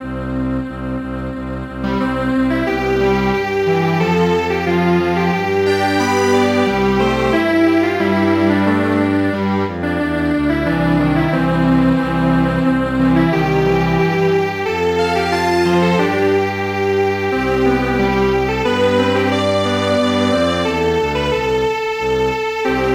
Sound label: Strings Quartet; Thai National Anthem